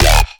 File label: bass hit processed acid D; Virtual Riot